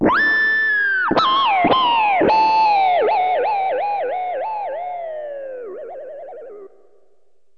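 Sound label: guitar harmonics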